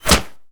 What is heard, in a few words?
Monster Generic Foley Hit By Bullet